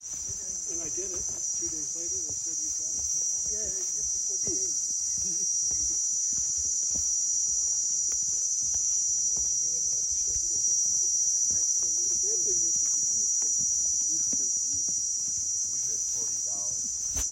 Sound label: Cicada Chirps; gorilla trekking in uganda